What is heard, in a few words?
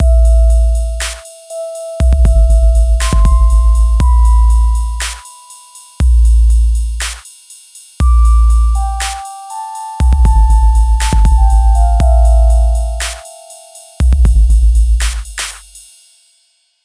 DARK BELLS